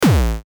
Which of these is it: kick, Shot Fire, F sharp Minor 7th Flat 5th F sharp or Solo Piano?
kick